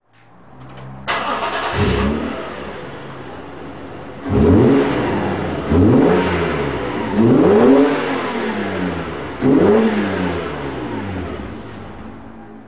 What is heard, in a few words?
muffler, car; chaser